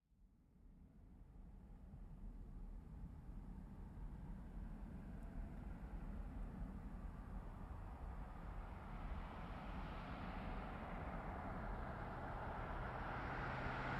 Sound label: GMC Savana, Up, Stop, Fast, Truck; 2010 GMC Savana; Trucks (Sound Effects:Vehicles); Big Room Sound